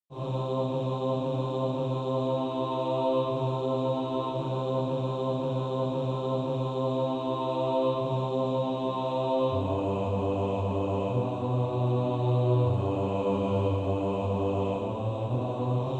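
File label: bass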